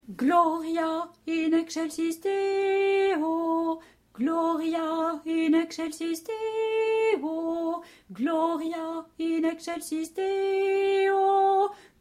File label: alto; sur la route des rois; gloria in excelsis